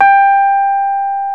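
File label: piano Rhodes Mk.V; Roland LCDP10 Keys of the 60s and 70s 2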